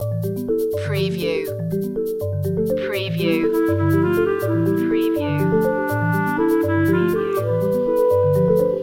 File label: mellow marimba loop